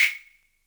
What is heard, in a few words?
wood, Univox SR-95